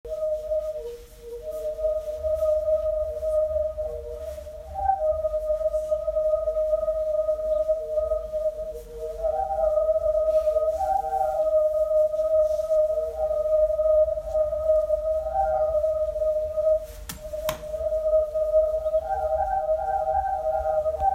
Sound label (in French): Tuyaux sonores